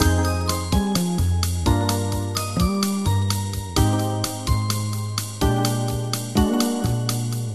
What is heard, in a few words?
theme loop morning raise